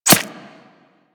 Arrow Impact Flesh; Warframe; Weapons

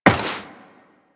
Step Metal, Footsteps, Walk; Warframe; Tenno

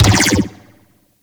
Ball Bounce Dome Energy; Missions; Tenno Ball

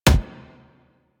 Weapon Punch Shotgun; Warframe; Weapons; Shotguns

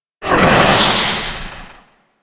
Generic Death, Vocals; Warframe; Orokin

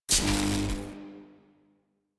Scrap Container Start; Warframe; Missions; Zariman; Zariman Assassinate

